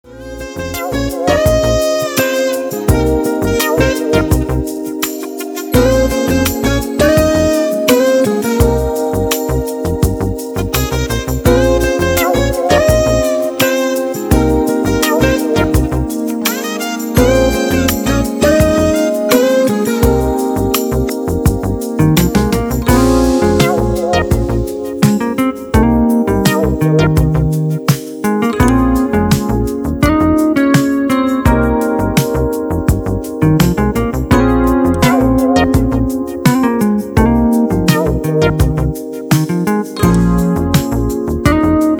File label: seaside mood, smooth jazz guitar; audiolounge soft